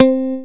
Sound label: bass, synths